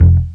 Acoustic, baesse

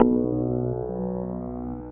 DX & click; instrumente

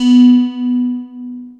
E-Piano; instrumente

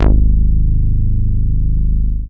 Deep Reso 303, baesse

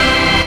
dance chord

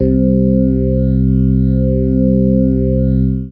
dance chord

